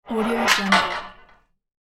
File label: metal machine switch lever hit; industrial sounds